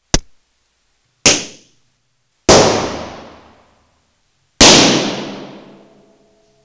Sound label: pops